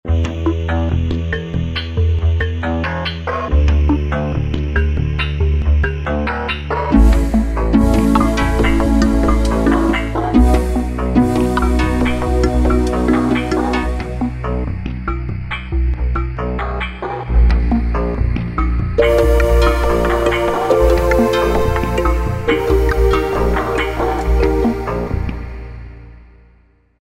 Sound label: Korg Wavestation A-D